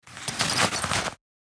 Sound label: Jacket pocket rustle rummage keys; foley